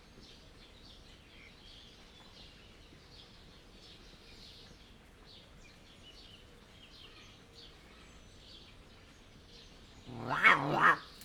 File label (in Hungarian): közben mozog, mocsári hiúz; jaszberenyi zoo 2015